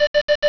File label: bleep, computers